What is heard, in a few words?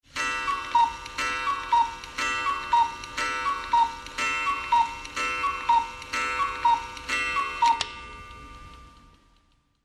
Cuckoo Clock